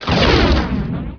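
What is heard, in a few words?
crash fiery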